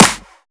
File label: hand clap sample G# key; percussion; single hits one shots